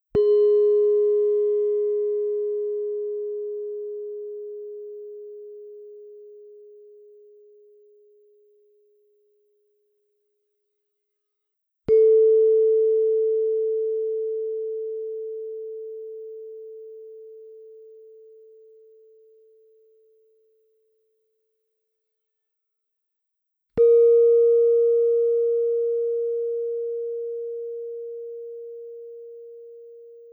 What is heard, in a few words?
chrom gender; sounds of mtb